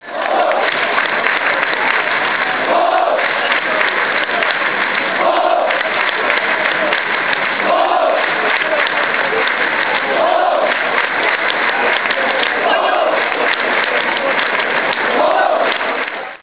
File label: clap clap clapclapclap, chants